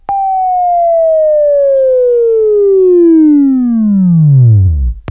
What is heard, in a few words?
WAV Left, Sweeping; Sweeping Training program